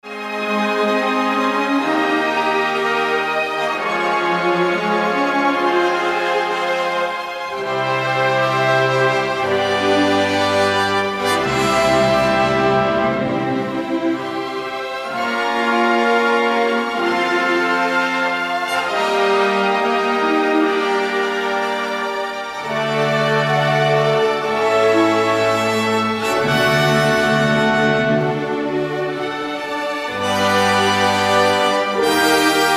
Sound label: Chorale